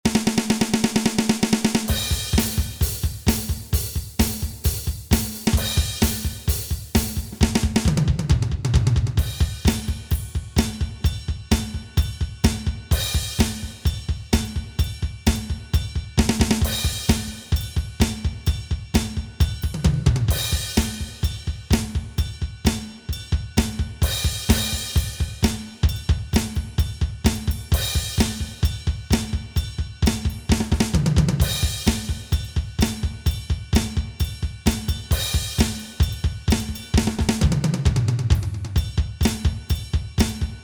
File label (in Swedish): Metall trummor, mobilsignaler